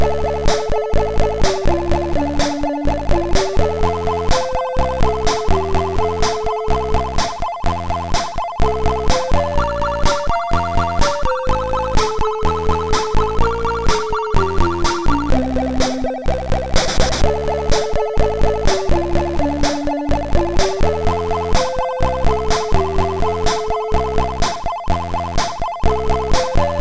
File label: Funny Cover, sid MOS6581R2